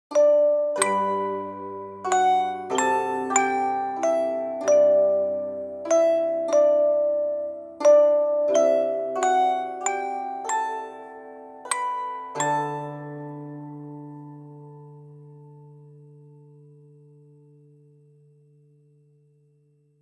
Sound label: Melody Double